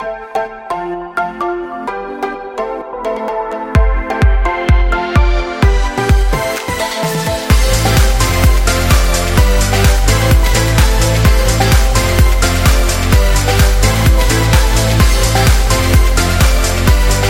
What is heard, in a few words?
Party Pop, corporate music; corporate music vol 18